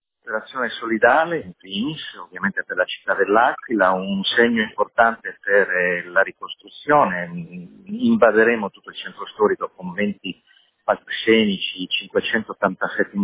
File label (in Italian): il jazz per l'Aquila